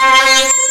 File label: FEEDBACK, SYNTH PATCH; Soundengine - CSR-000 The Art of Transwaves